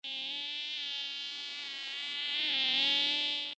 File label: fly